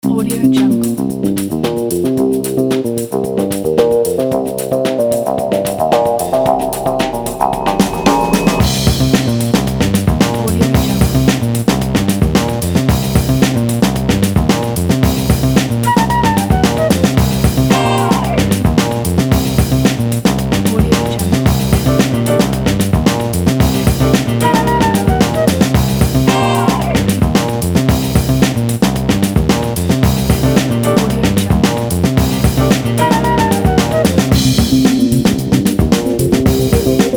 action funk groove music

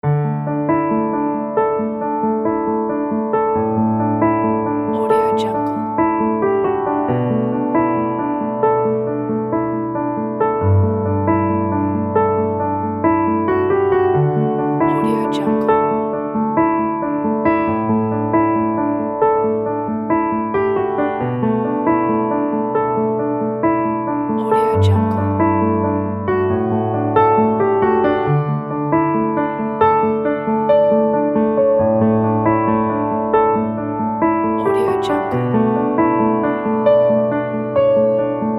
wedding piano music

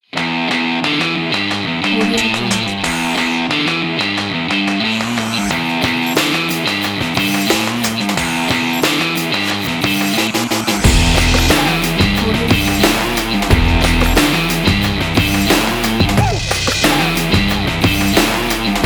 action advertising background music

